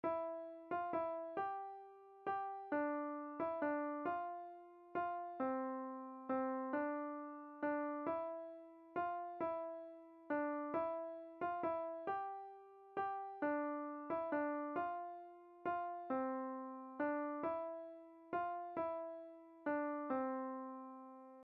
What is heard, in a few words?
Mozart sonata in A, piano